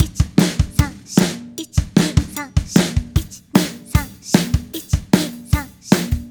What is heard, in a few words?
backbeat rock